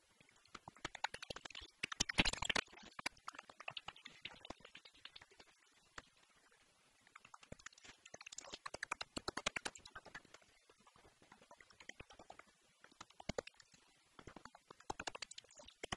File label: ultrasonic bat calls